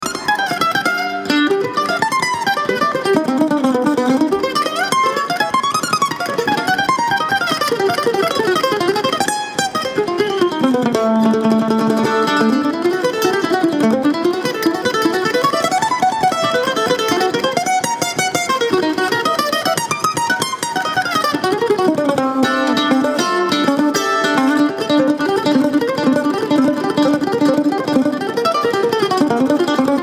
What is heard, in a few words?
Kentucky Mandolin